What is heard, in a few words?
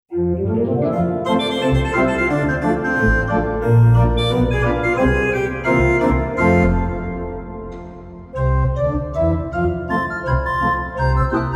Organ Rag